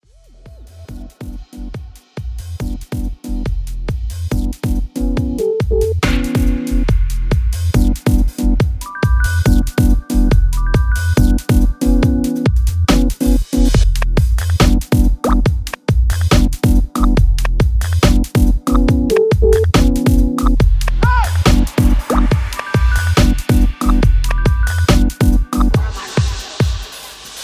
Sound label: DUBSTEP